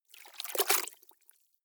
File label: clothes water updown short soft, haushalt; haus garten